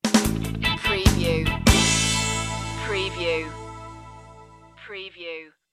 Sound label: reggae stinger; intro